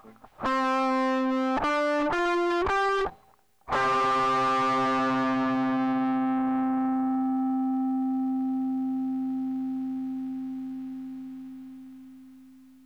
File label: overdrive, Guitarra